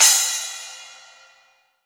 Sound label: SPLASH